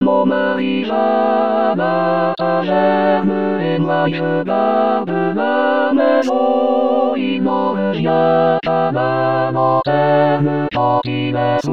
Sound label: tutti; rimailleurs